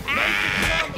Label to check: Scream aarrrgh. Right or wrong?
Right